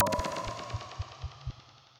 Plinky Plonk, Sci-Fi Effects; Ableton; Mood Reel

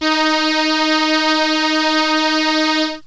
Solina Trumpet D#3; Analog Synths; Ableton Foundation FreePacks

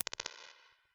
Space Repeat, Strange Places; Ableton; Convolution Reverb

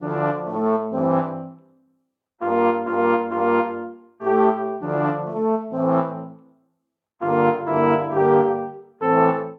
Phrase i-VII-iv-v D Minor 100 bpm, Brass Quartet; Enterprising; MIDI Clips; Ableton; Spitfire Audio

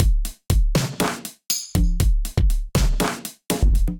Kit, Drums Hybrid; 24 7 Kit; Ableton; Factory Packs; Drum Essentials

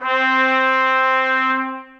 Trumpet Section Legato; Ableton; Orchestral Brass

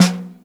SN Bottom, Snare; Ableton Foundation FreePacks; Snare Designer

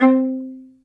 Viola Solo Staccato; Ableton; Orchestral Strings

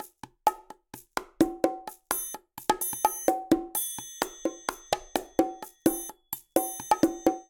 Latin Fusion 128bpm, Latin Percussion; Ableton; Factory Packs

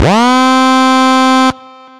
Squeee, Effects

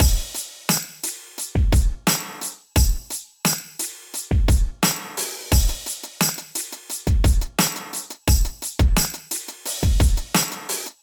Acoustified Kit; Ableton; Bomblastic

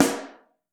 SN Mono, Snare; Ableton Foundation FreePacks; Snare Designer

